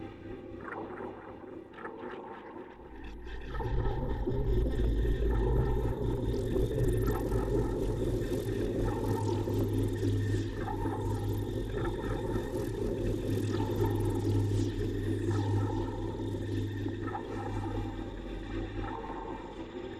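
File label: lithoid ambient science pulsar; Stellaris; lithoids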